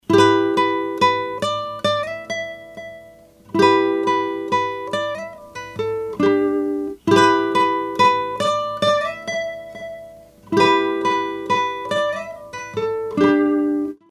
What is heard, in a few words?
reverb; orinj